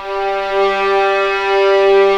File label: G3 EXP MF, VIOLINS; East West; The Ultimate String Collection